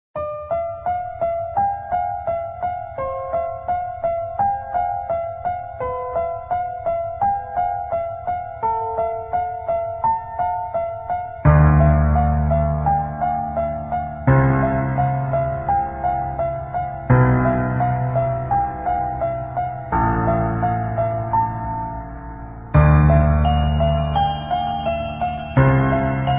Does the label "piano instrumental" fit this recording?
Yes